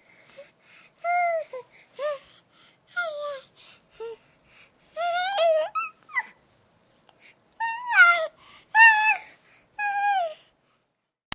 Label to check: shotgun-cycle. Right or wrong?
Wrong